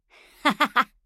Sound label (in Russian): смех; Сомбра